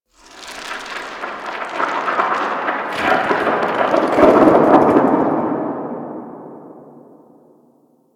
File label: Rock Fall Cliff Distant